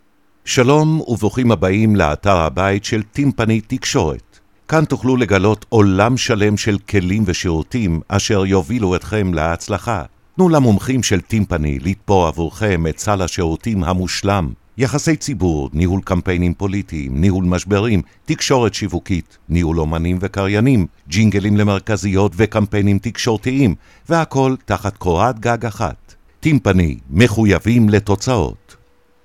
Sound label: timpany